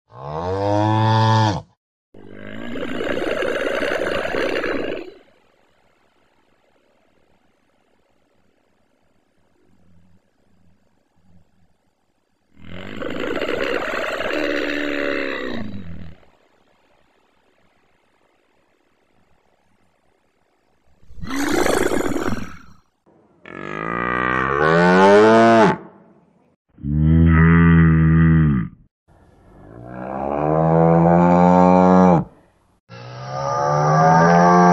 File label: Kouprey; گاو کامبوجی